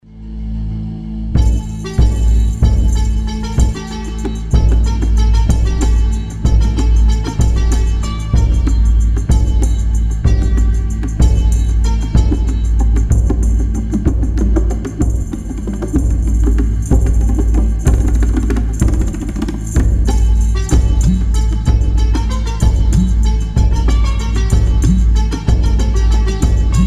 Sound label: 432 Bone Dance Sample